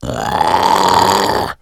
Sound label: alert; Left 4 Dead 2; hunter